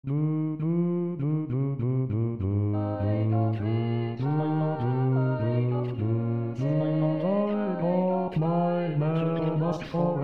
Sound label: Bass